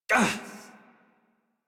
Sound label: Pain Short, Adult; Warframe; New War; Adult Operator